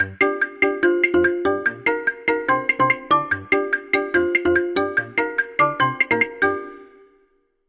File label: Kungfu, Black Shark